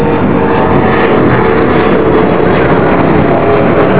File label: Bombardier